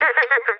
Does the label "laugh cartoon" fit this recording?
Yes